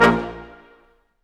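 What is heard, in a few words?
BRS Q.SHT, Roland, brass staccatos, quintet; Roland L-CD702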